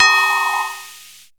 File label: synth d#, Ambient Atmos; Dance Synths